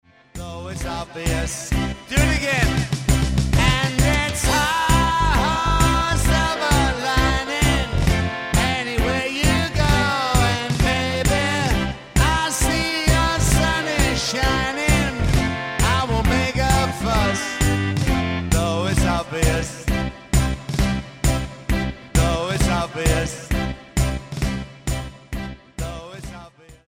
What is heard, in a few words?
Party